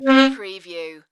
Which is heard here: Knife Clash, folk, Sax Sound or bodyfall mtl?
Sax Sound